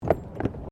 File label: Tram Horse Drawn Step Wooden Bridge